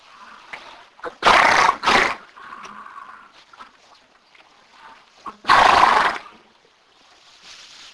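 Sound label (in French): cheval; Cheval 003 (éternuement)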